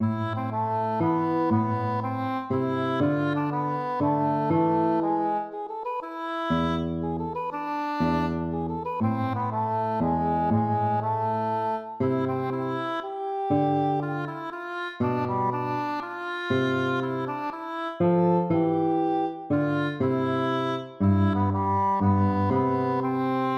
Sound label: baroque minuet minor